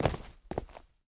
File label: Jump Land; bloom minigame